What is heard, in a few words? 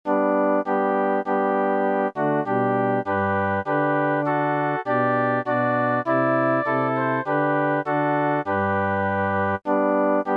SATB; Hark the Herald; Choir